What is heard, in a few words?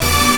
CHORD, STABS